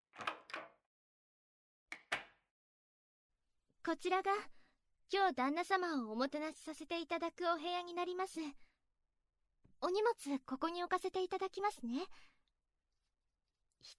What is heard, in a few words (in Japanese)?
お団子 と ぽかぽか マッサージ